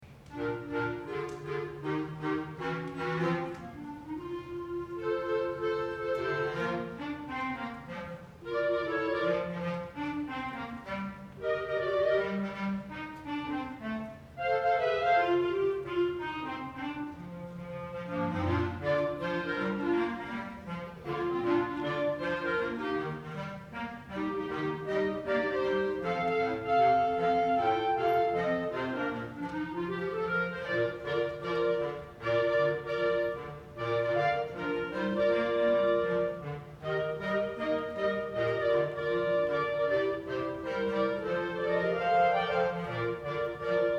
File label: swingin jingle bells